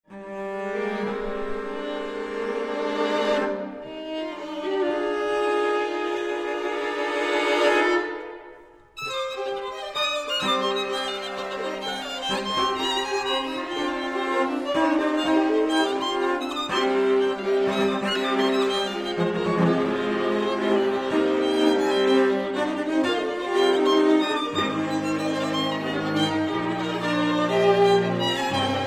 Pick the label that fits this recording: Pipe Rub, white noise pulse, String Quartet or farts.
String Quartet